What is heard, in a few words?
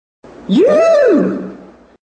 excited autotune cat